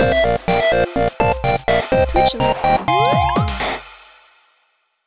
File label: 8-bit donation